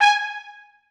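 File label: trumpets staccato g3; Sonatina Symphonic Orchestra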